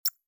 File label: hover